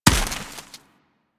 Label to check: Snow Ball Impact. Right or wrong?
Right